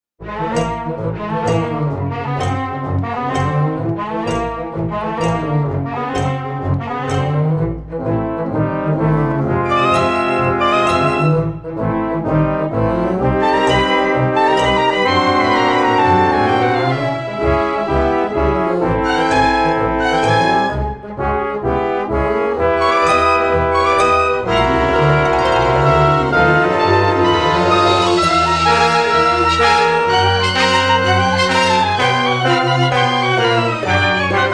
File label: Undead Intense